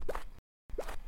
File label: penguin walk; CodenamePenguin